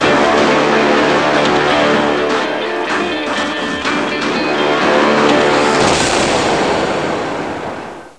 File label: zm win